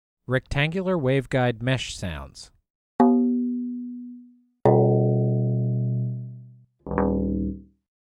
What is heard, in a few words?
Rectangular Waveguide Mesh